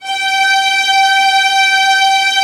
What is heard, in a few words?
VIOLINS, Synclavier Strings; ILIO